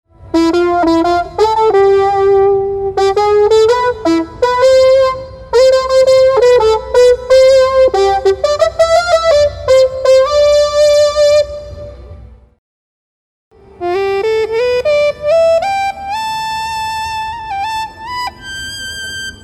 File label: EWI4000s; monitor; musicfair2005